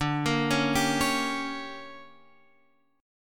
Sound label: D Major 7th Suspended 4th Sharp 5th, Guitar Open F; DM7sus4#5 arpeggiated